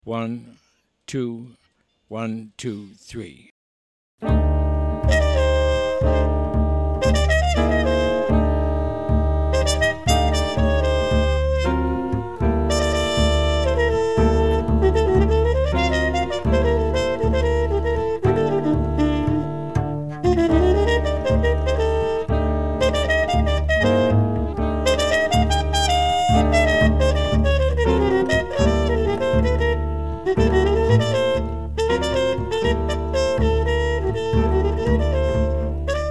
minus drums

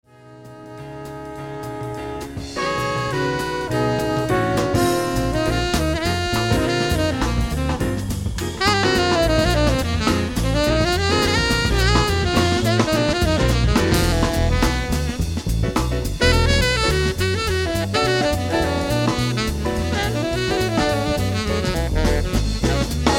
solo

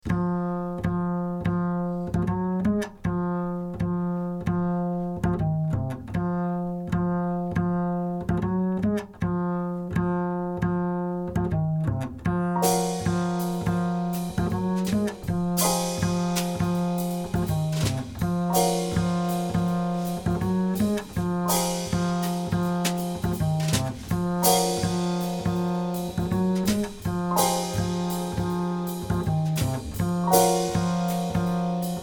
minus melody

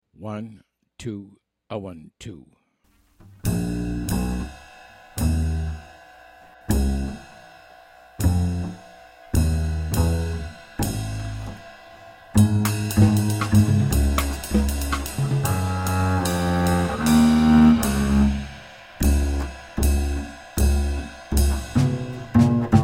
bass drums only

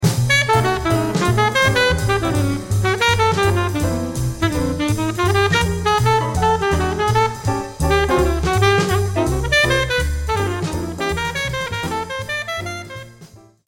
alto solo

